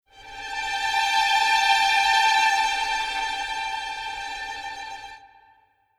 Strings Trill

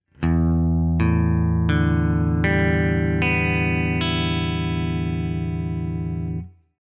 guitar Regular

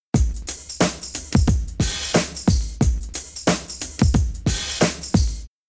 Rhythm HipHop

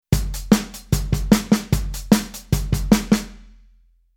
Rhythm Rock n Roll

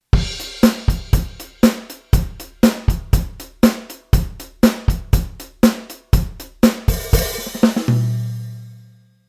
Rhythm Kit Funk

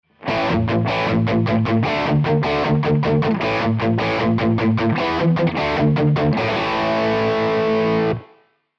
guitar bridge mute

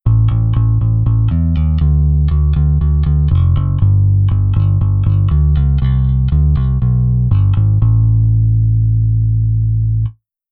Bass Velo Up Dn Finger

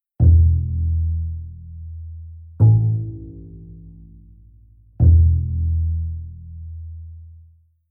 pizz

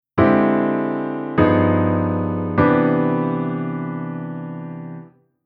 Chord Tension IIm7b5 9 11

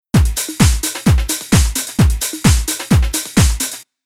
Rhythm Loop

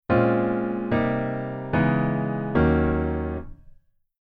Jazz Waltz Chord Verse

